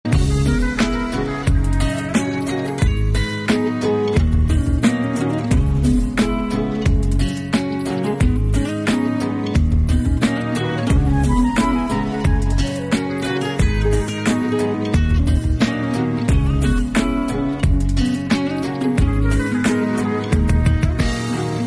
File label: guitar; ringtone